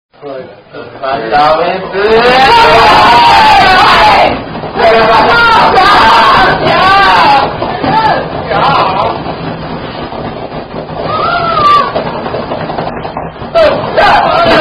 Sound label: Signs Indian Drinking Game; Thumper